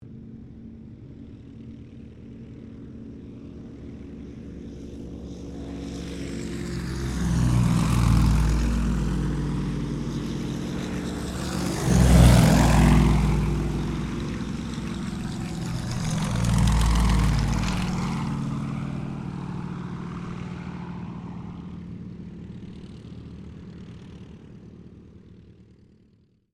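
AIRCRAFT YAKOVLEV YAK-52 SQUADRON TAKEOFF, Russian Training aircraft; Airplane Sound Effects; Vehicles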